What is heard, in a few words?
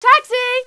taxi